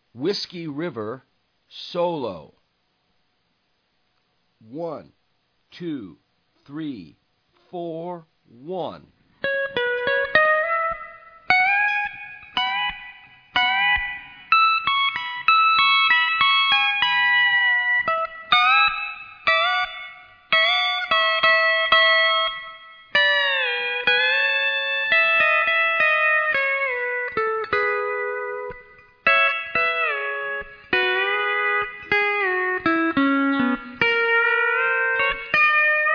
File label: slow